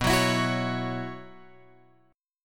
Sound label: B Suspended 2nd Suspended 4th; Bsus2sus4 Chord {7 9 X 9 7 9} strummed